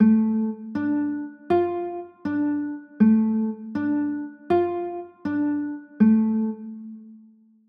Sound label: d m, chords